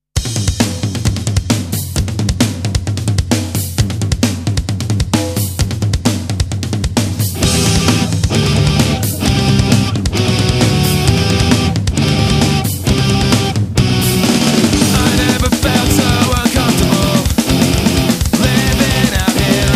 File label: Dropout